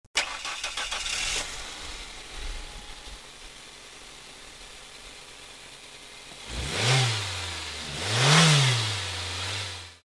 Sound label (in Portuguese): CARRO